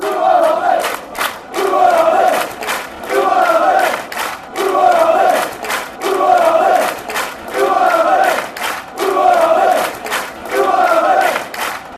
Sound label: blue and white army carlisle, fan chants